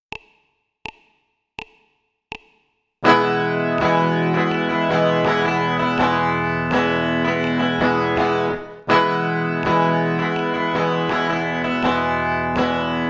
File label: e strumming pattern, guitar